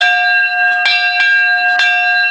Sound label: antique brass train bell